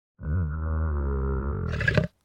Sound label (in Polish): Ryk smoka zanurzanie